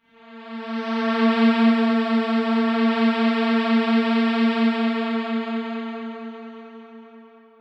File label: fx synth